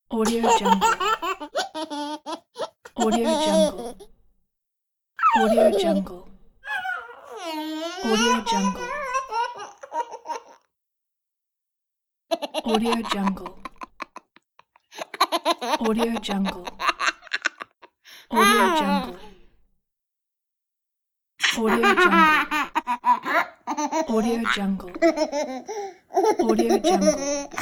toddler laughing

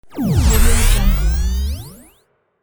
space transition

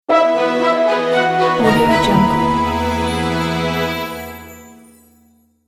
classic reveal logo

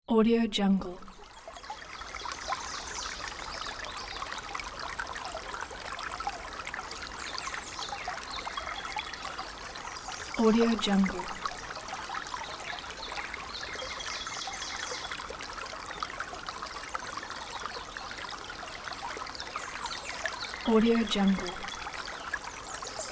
creek in jungle

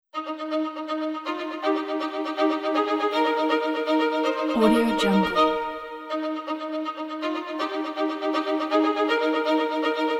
fantastic fiddle

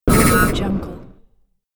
game explosion

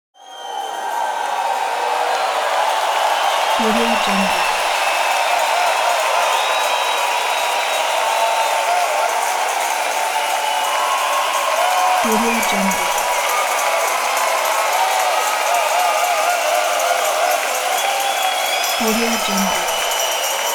crowd

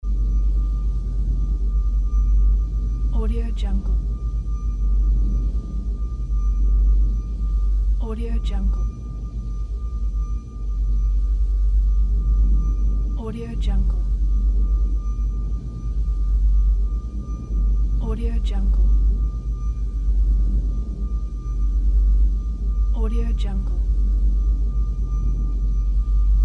space ambience

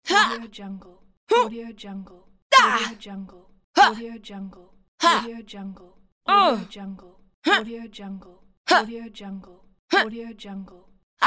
woman attack grunt pack

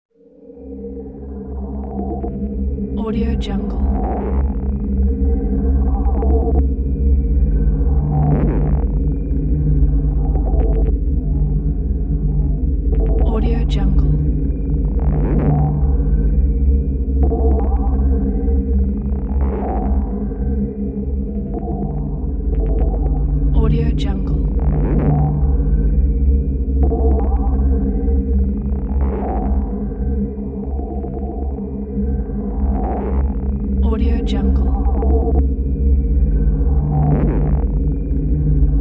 alien computer ambiance

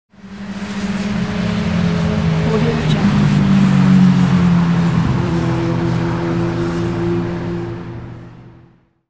motorcycle passing by; soundfoodsfx

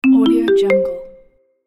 marimba correct answer

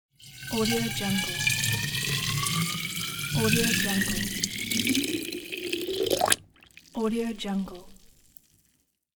filling bottle with water

